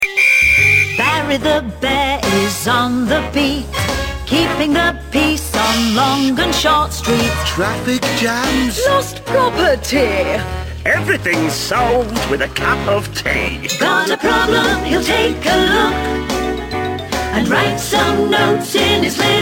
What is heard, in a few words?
Bear Song, children songs